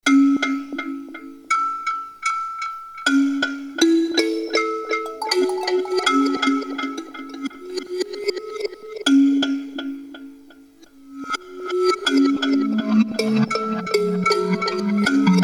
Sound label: Roland TR 8S Pattern